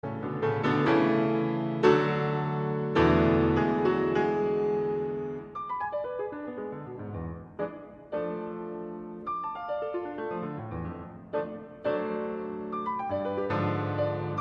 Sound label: Piano Sonata in A Major, Allegro